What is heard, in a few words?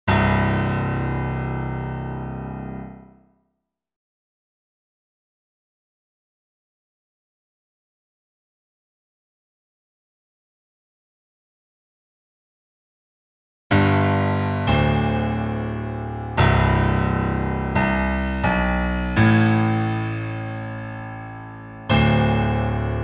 Piano